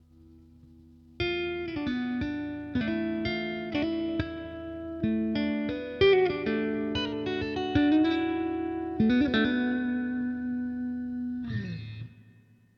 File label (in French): guitare electrique